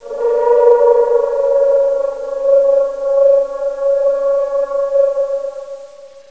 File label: TONE; PC Gamer IT CD 38; thief